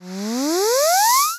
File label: riser